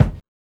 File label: BD; E-Lab; X Static Goldmine 1